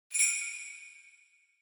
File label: Sleigh Bells Reverb Shake Gentle